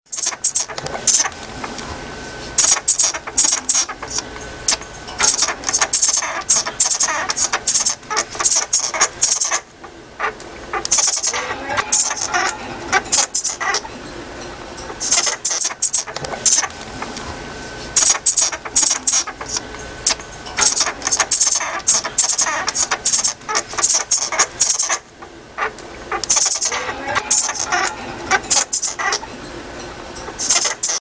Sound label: sugar glider nursing painful